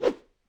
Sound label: Imp Attack Whoosh, Melee Whooshes; Foley